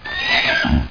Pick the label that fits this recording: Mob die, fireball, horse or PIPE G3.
horse